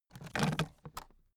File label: aluminium case pick up